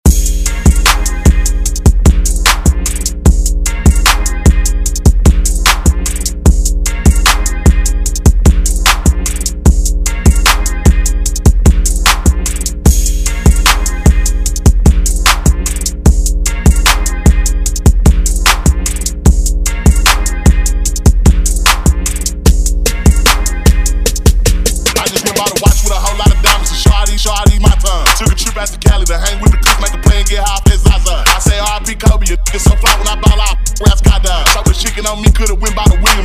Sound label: Hiphop Redrum